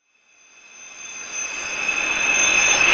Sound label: Hissing Approach